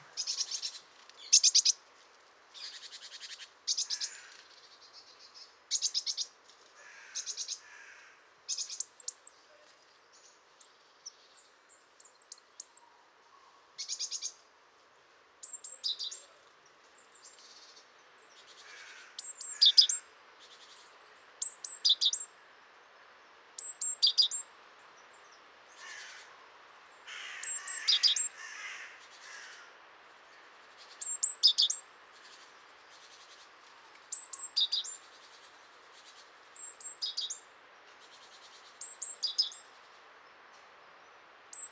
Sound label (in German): Vogelstimme Kohlmeise, Great Tit, Parus major major; Vogelstimmen